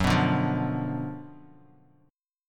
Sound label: F sharp Major 7th Suspended 4th Sharp 5th, F sharp, down, Bass Standard; F#M7sus4#5 strummed